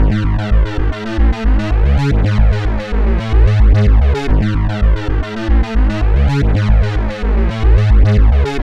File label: SYNTH